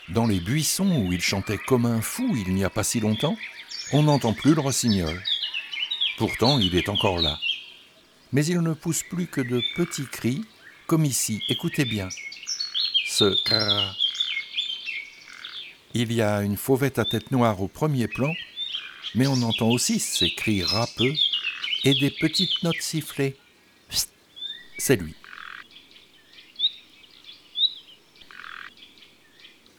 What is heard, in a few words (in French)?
Rossignol philomele, chants d'oiseaux